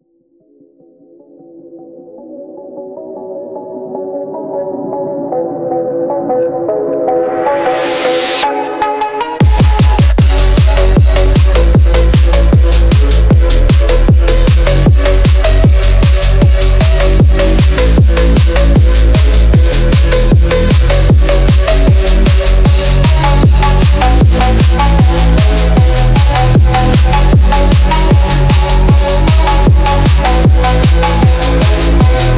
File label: phonk house